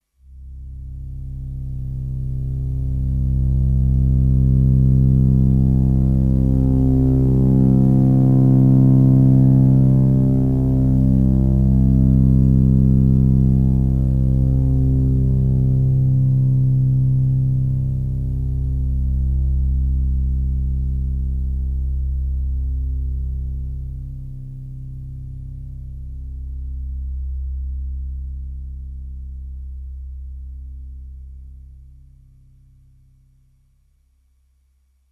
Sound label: ms20 bass